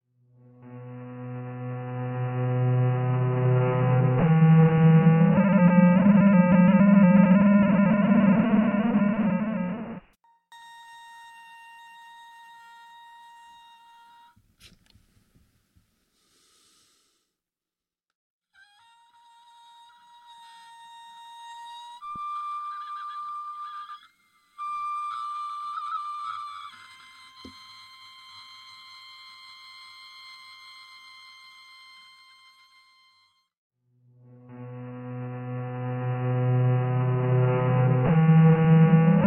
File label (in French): larsen, exterieur; pascal deleuze; websynradio